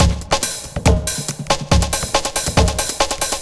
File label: ind 140 kit